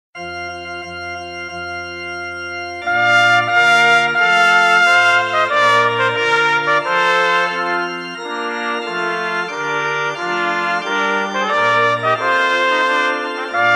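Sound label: trumpet tune trabki, trumpets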